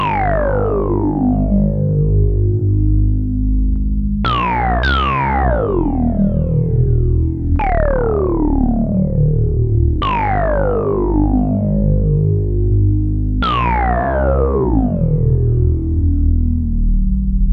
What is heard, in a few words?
S100F Filter Sweeps